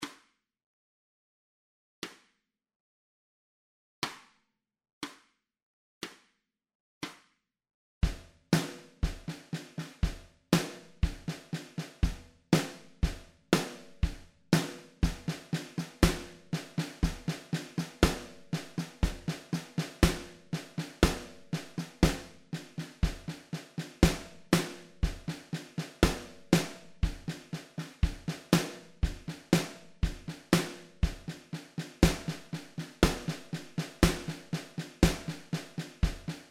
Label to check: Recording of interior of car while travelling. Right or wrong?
Wrong